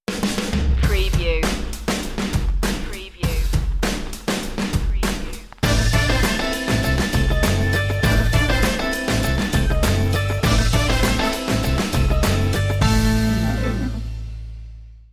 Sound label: funk punch; 15secs